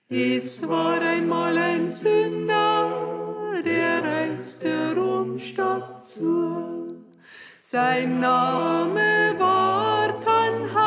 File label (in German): Sopran; Es War Einmal Ein Sünder